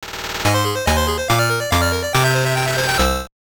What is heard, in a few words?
Jingle; wonderswan color; tetris